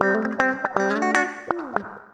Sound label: Acoustic Guitar Loops, 120